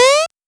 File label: jump